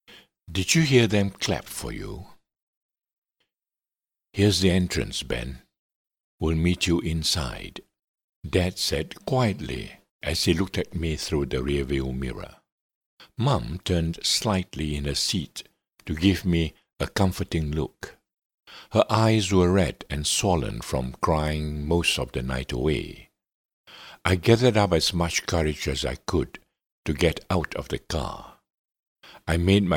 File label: clap